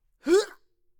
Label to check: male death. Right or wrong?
Right